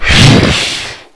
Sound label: demon melee